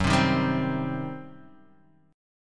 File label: G Major 7th Suspended 4th Sharp 5th, Gb